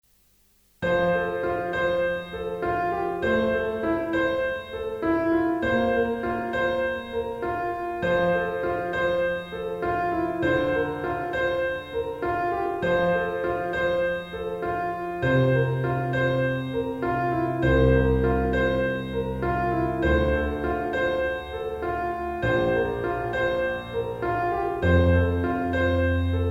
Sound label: piano pop